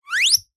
poster holographic scratch grooves with fingers whizz sound; foley; zapsplat